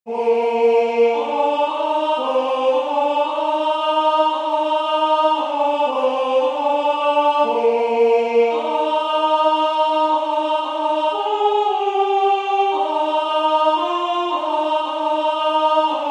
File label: Ave verum Alto; SaintSaens